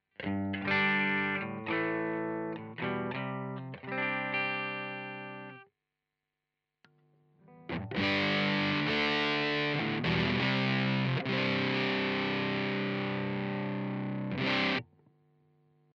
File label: Bridge Kemper Sustain; 8 Tone 12